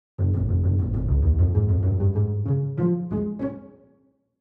pizz

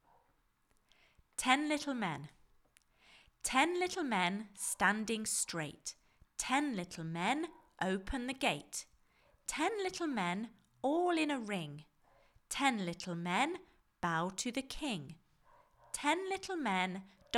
Ten Little Men

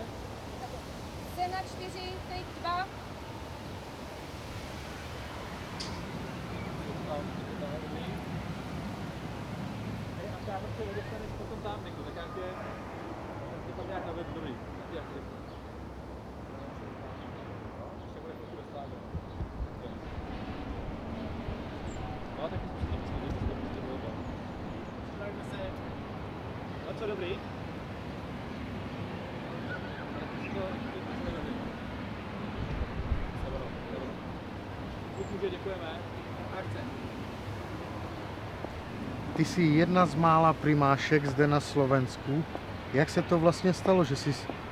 boom; eq ukazka

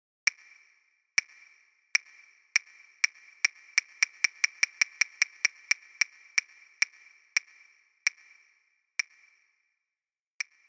CLAVES

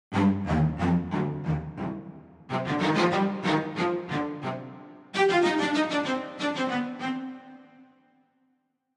VCLLI stacc

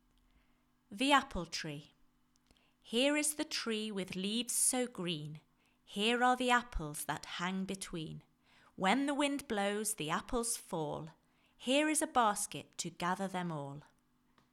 The Apple Tree

